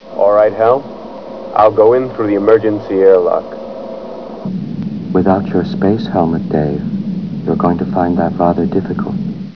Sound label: airlock